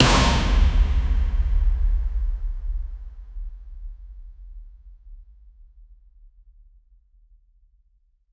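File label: create a vintage, upright bass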